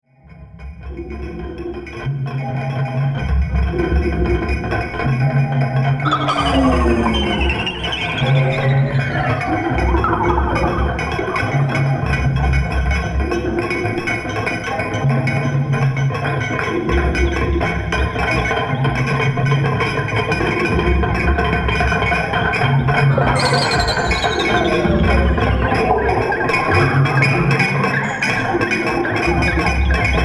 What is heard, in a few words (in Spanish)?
musica electroacustica espanola